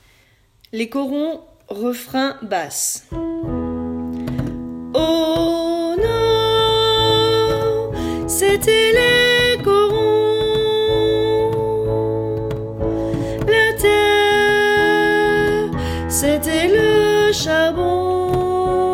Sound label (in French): Basse